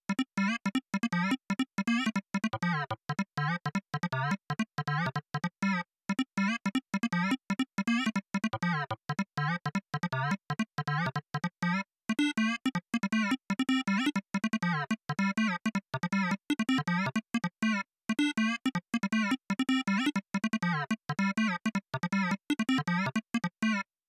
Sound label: Melody; chippy